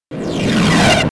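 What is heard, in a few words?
wizard fire cast; DAoC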